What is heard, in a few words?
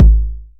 909 BD; Goldbaby Tape808 & Tape909; The Tape 909